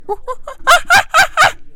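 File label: monkey oohahh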